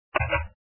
equipa; anacroz

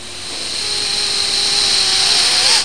SOPRO; 7invasores

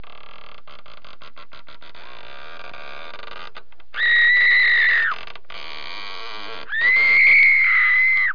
Horro, Horror

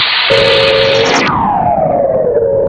porta abre; 7invasores

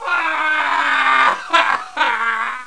Cobra; GEOGRAFIA

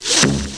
engarraf; 7invasores

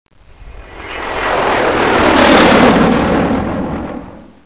foguete; GEOGRAFIA

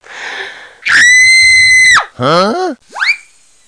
grito; GEOGRAFIA

